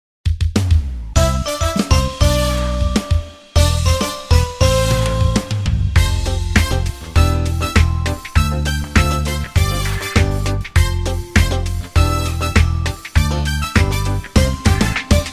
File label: KARAOKE